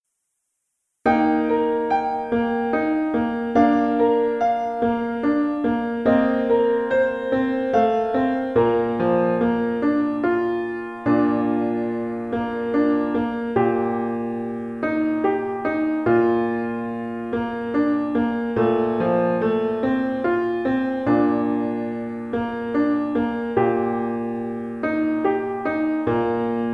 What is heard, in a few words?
nachtlied accompaniment; elementary solos accompaniments